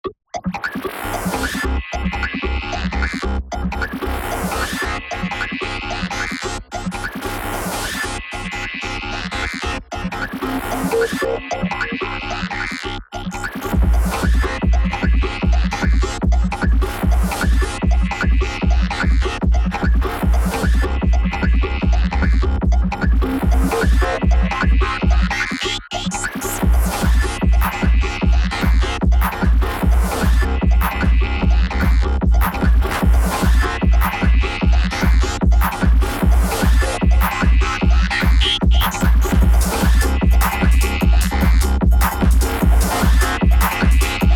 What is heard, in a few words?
Acid Noise, Korg Emx1 Electro 145